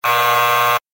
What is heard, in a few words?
incorrect